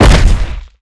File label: Dark Fog Die; Seer; GamigoNA